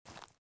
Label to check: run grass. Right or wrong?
Right